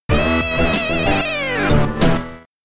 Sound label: Heavy Metal Jingle; amiga